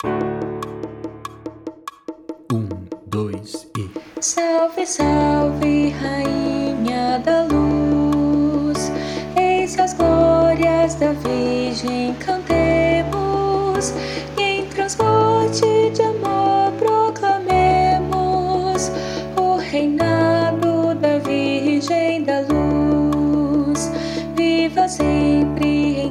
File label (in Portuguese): contralto; estudo